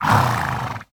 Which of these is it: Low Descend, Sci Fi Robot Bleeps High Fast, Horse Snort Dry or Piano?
Horse Snort Dry